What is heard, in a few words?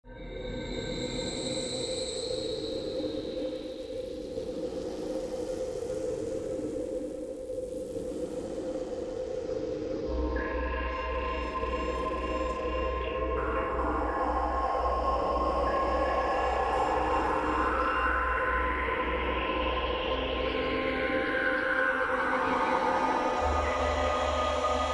Haunted Hallway